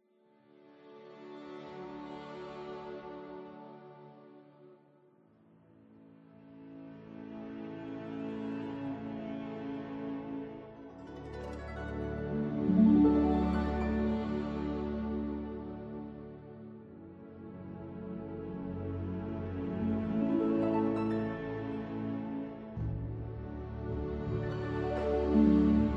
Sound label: Background Loop